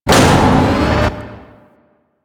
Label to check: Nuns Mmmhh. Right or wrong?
Wrong